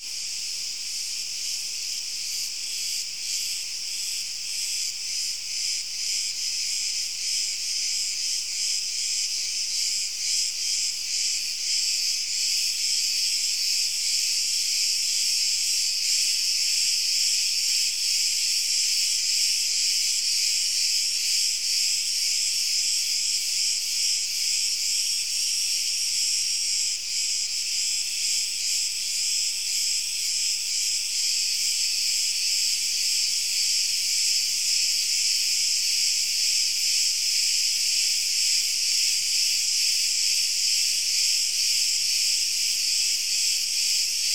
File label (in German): Grillen Rein